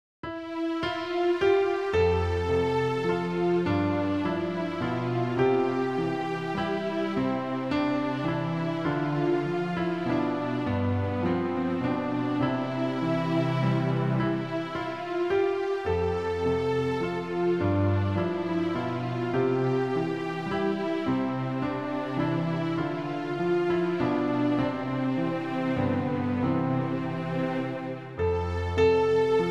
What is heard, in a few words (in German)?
tivadar klavier; Tivadar községnek ajálnva